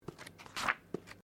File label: Book Page Heavy Flip; Book movement; Books (Sound Effects:Offices)